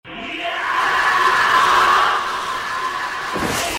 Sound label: screaming; friar tuck